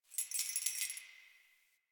Keys Jingle

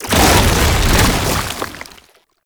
Proto Infested Babau Passive Stop; Warframe; Abilities

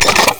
Flying Robot Engine Start; Warframe; Veilbreaker; Ambience; Kahl Quest

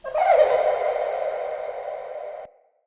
Day Pixies, Ambience

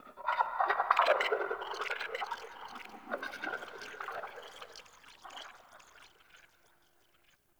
tunnel critter; Warframe; Tau

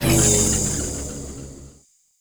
glyph tower shutdown; Warframe; Duviri; Glyph Puzzle

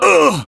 Pain, Barks; Kuva Lich; Persona D

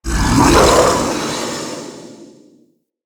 Male Vocal Attack; Characters; Enemy; Mockeries; SubBoss; Mockery

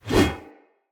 One Handed Axe Elite Whoosh; Weapons; Enemy; Axes; Sinecure One Handed Axe Elite

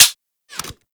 Fire Mech, Rifles; Snipetron Vandal

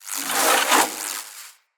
Casting Device Projectile Whizz By

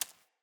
Sparrow Seed Feed Peck; Animals

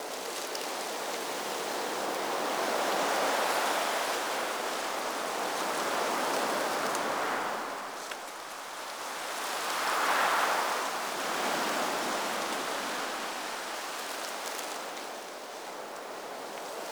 Winter Tree Wind Loop; Warframe; Ambience; Nineteen Ninety Nine

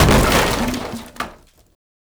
Wood Fence Impact; Warframe; Ambience; Nineteen Ninety Nine

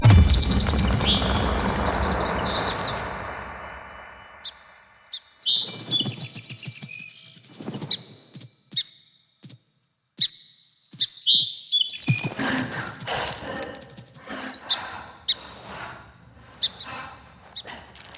Beach Scene; New Player Login Sequence

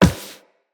Walk Metal, Footsteps; Operator

